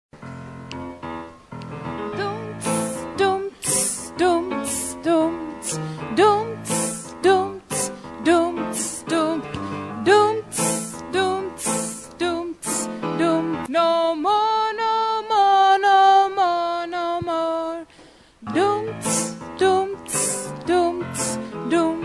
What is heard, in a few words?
tenor